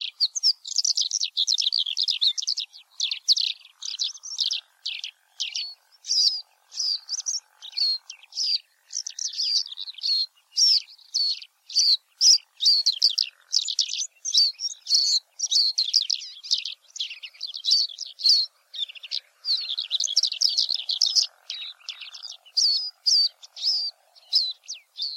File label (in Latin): alauda gulgula